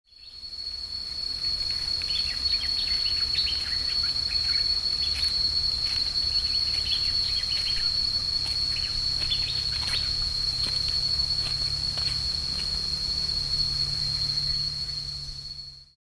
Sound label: ko phangan hills